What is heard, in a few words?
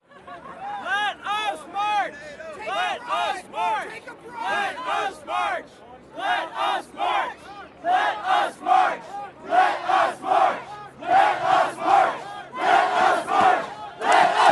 protests let us march downtown; sonniss gdc